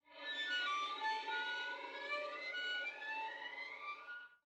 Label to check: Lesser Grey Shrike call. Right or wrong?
Wrong